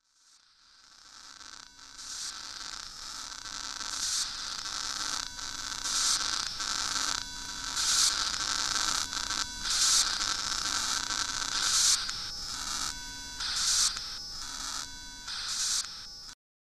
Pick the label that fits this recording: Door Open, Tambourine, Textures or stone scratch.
Textures